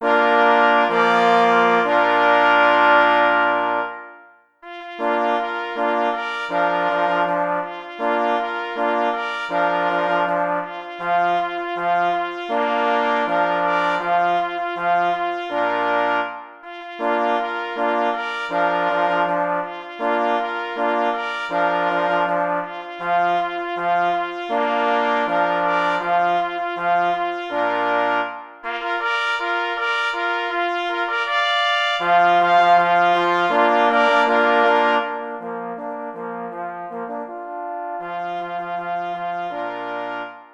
Marsz na 2 plesy i 2 parforsy; J. Rosner

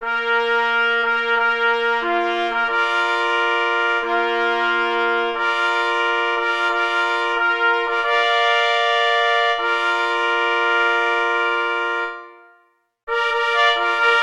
na 3 plesy; Na chwale munsterlanderow; pies w muzyce lowieckiej

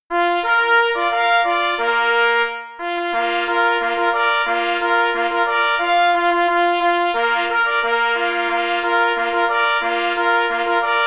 Marsz; J. Rosner

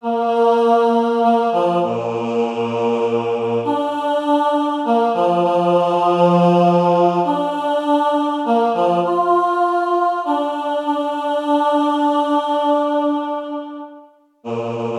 Piesn mysliwych prymka ze slowami spiew, myśliwych; Cz. Trybus

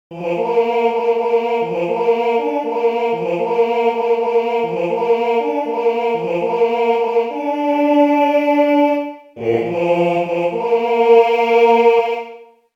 Zajac na rozkladzie, sygnaly mysliwskie; sygnaly mysliwskie ze slowami

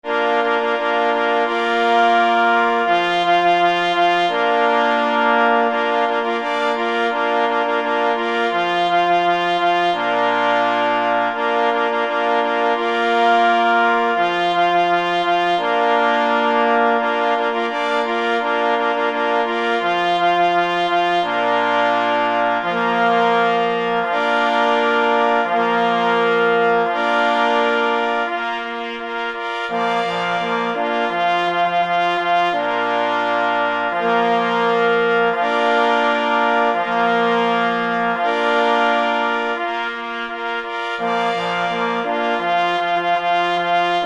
na duzy zespol rogow mysliwskich; Na czesc sw. Huberta

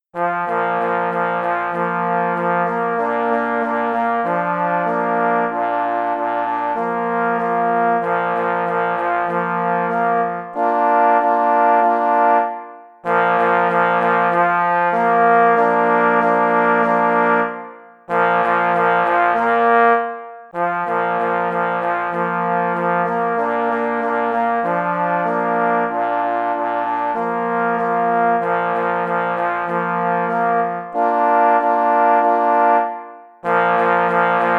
na 3 parforsy; J.Jenis; Slowacki kopov; pies w muzyce lowieckiej

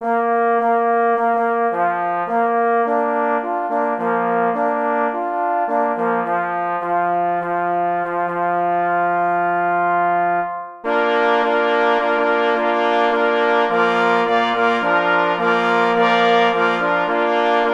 Pobudka spalska na 3 plesy i 2 parforsy, marsz-fanfara; Anonim; oprac. K.M. Kadlec; marsz-fanfara z około 1905 roku towarzyszyła spalskim myśliwym

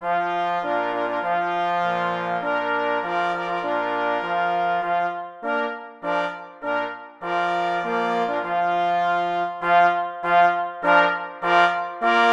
hejnal sokolnikow na 2 plesy i 2 parforsy; Cz. Trybus

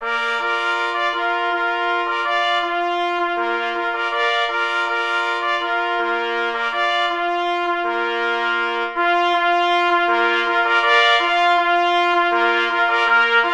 Fanfara kynologiczna na 3 plesy; pies w muzyce lowieckiej

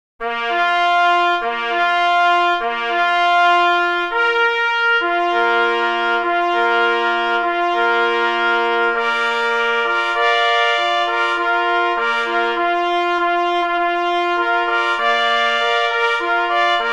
Hejnal, na 3 plesy; Hejnal KL im. Wojskiego w Gdyni

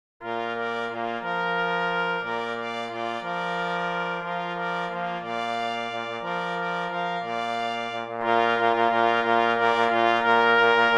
Fanfara; St. Rajba; Fanfara III; powitalne fanfary stanislawa rajby

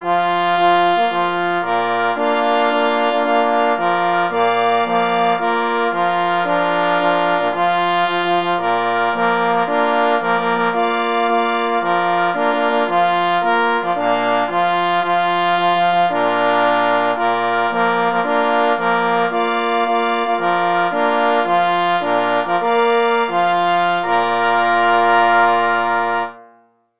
Hejnal na 2 plesy i 2 parforsy; A. Kanawka; Hejnal Ksiecia pszczynskiego; Artur Kanawka (ur. 1970) – waltornista, dyrygent chóralny i orkiestrowy, kompozytor

